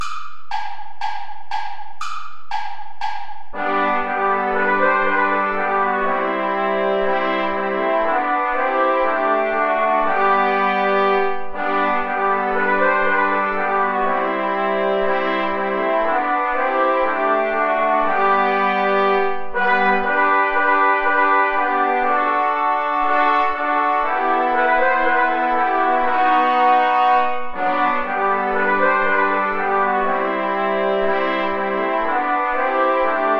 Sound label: play along without trombone; steuerlein johann entends une chanson